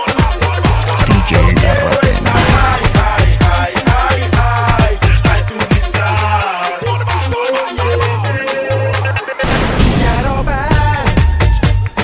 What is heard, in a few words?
Tune; Oppo